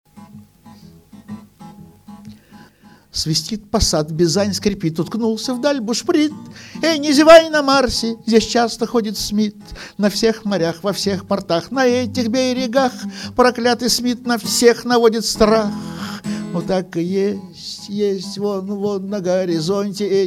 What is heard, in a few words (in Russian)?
svistit